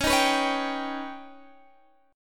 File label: D sharp Augmented 7th C sharp, guitar